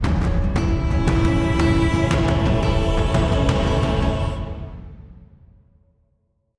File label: Bird Rescue Stinger; Dynamic Music; Thawtide